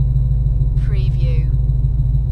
Mustang 68 Fastback Engine Idle Loop - On Board Perspective; Revving car engine sounds; lynne publishing